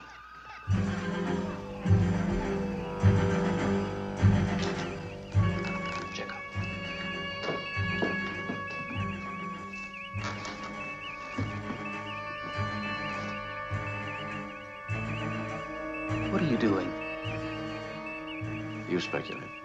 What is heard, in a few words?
Battle Suspense, music; star trek